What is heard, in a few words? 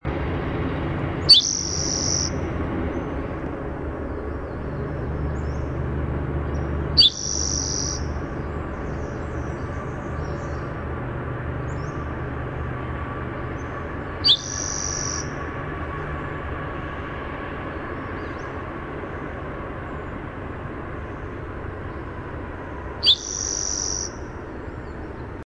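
Spotted Towhee Primary Song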